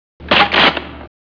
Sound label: Ammo